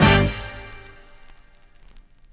Stab Horn Wah Soul

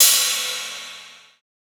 Ride Dry Funk Brush; Drums

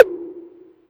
E-Perc Block, Electronic Percussion; Drums; Ableton Live 12 Lite; Core Library

